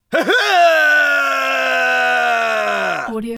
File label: vocal human ai battlecry roar male computer voice